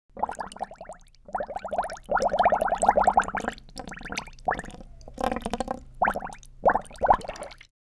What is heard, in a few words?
Cartoon bath bubbles